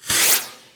firework single; best service Blue Box

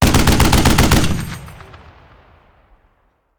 Machine Gun; Sound Ideas; Guns

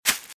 Velociraptor - Footstep - Grass - Run - Right; RadwinIsland

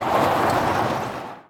skid dirt long; Blind Drive

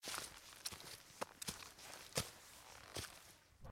Raw Road Steps

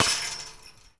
Street Ball Metal Rim Chain, Street Basketball; SFX Kit

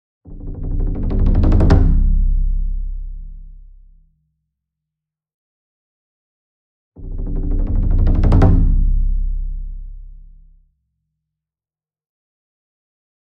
SINGLE DRUM Taiko 28 Inch Roll Soft Beater Near; boom library; Cinematic Strikes Construction Kit

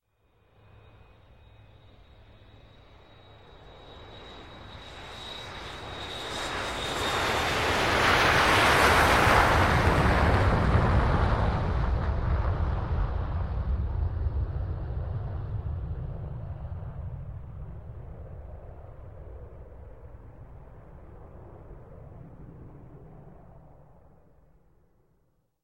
Large Jet Take Off; Sound Ideas; Series 2000

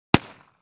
gun hand gun; NCH Library

